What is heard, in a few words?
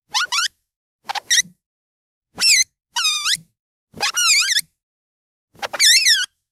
comical slip and fall sound with a squeak